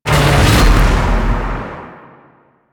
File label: Void Dragon Chomp; Enemies; Abilities